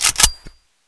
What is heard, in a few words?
g3 bolt pull, weapons; garrysmod